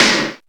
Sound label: prominent steel snare drum d sharp key